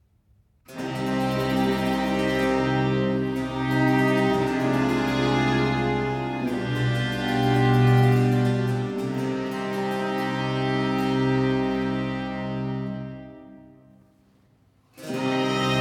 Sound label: Sinfonia 1. Grave; Arcangelo Corelli; Corelli - Complete Works; Klassika